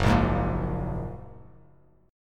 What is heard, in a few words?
F sharp Major Add 9th, Bass Standard; F#add9 strummed